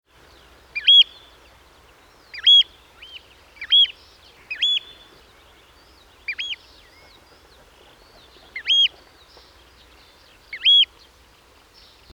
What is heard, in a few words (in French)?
huitrier pie appel